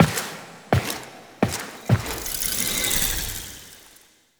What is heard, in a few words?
Orbital Station Window Transition; Warframe; Tau